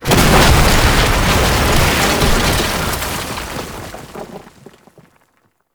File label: Ceiling Collapse; Boro; Warframe; Tau; BoroOverlook